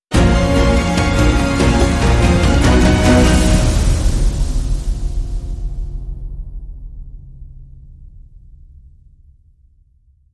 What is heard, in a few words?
Sting No Choir